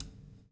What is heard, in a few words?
PULL, Backbeat; Spectrasonics - Backbeat